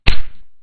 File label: punch; attack